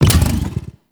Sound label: Rev From Stop, Motorcycle; Warframe; Vehicles; Motorcycle Ninety Nine